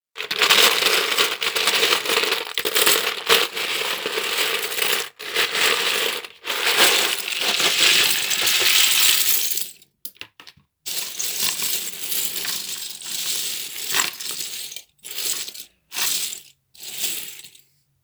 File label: Lego sound